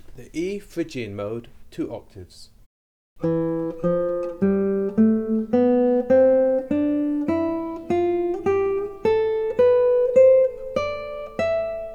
E Phrygian Mode, guitar

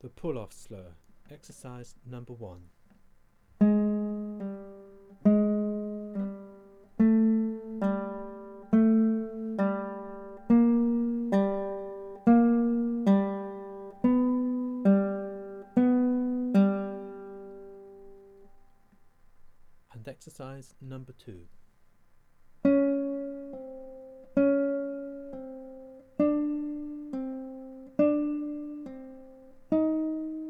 Pul Off Slurs, guitar

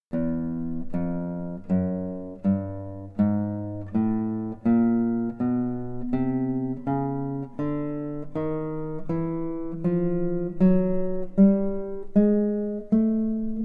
Chromatic Scale

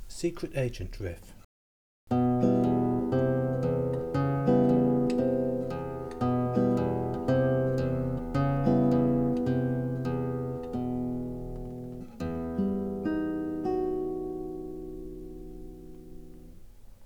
Secret Agent Riff

